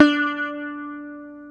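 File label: ELECTRIC SITAR D3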